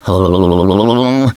hublblblbl; DS; ML BOWSERS INSIDE; Luigi

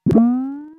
swim; mario; SNES; SMRPG

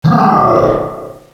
"huuugh", Echo inside caves, underground; DS; ML BOWSERS INSIDE

